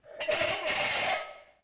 Raspy String Can Pull; Vintage Cartoon; Foley

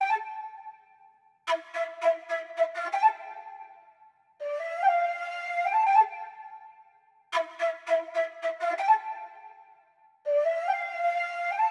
flute, 164 BPM A Min; Cymatics; Holy Lands

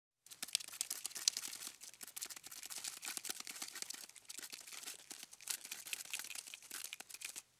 Ants Scurrying About; Fairlight Sound Lib

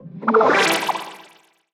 Impact FX; Cymatics; Video Game Sample Pack Vol 1; Galaxy Rush

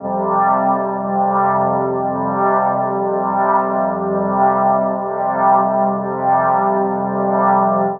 Dub Drone Fsus2 120; Dub Techno; Ghosthack; Dub Techno & Deep House Chords

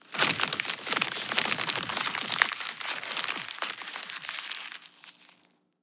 Foley - Paper; Loops; Ghosthack - Color Bass Essentials

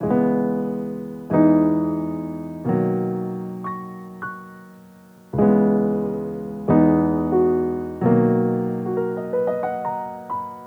Piano Dry - 90 BPM B Maj; Melodics; Cymatics - Eternity Sample Pack

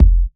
Kick, House Drum One-Shots; Ghosthack; Advent Calendar; House Kit

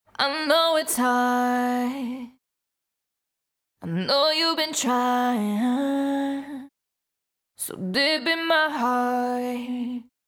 CLEAN LEAD (EMaj) BPM123, Pop Vocals, Acapellas; RAIN

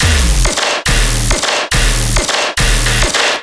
Bass Loop D# 140BPM, Dubstep; Sailing Bass; Ghosthack - AC2024 - Day 13 - Dubstep Sounds 2025

